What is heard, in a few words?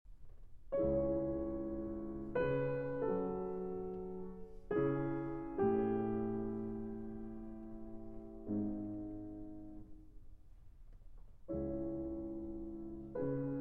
Nocturne in C-Sharp Minor, For Cello and Piano; Nocturne No. 20; B. 49